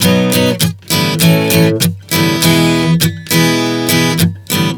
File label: Progression 100 B-A-E, Acoustic Guitar, Chord Progressions 100 bpm